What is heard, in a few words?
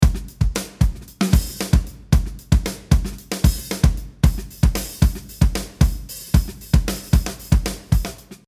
Drums MODERN DRUMMER; NI; ABBEY ROAD